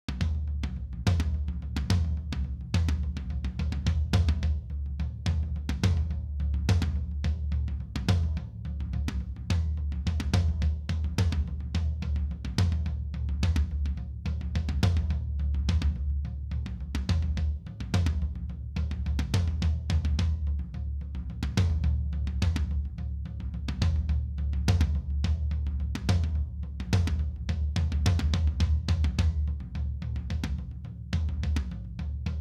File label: toms; AugustRain